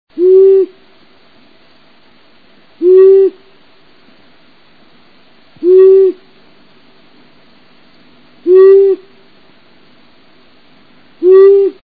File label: Long eared Owl